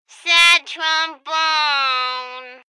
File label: Sad Trombone